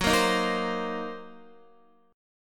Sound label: Gb 6th Flat 5th, down, Guitar; strummed; Gb6b5; JGuitar